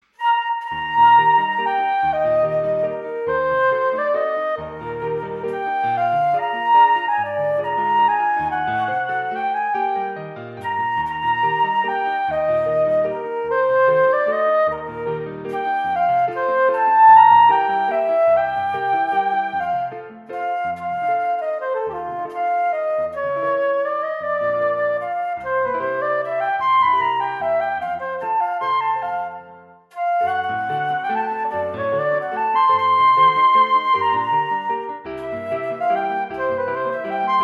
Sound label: flute, and piano; A gentle, lilting theme for early-level players